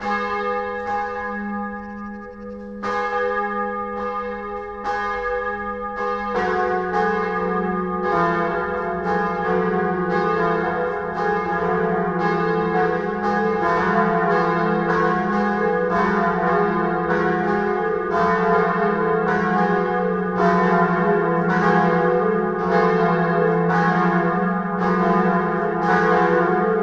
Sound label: BELLS, Cathedral; ILIO; Propeller Islands